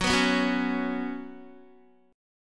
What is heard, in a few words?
F sharp Suspended 4th, Guitar; strummed; 13,14,11,11,13,x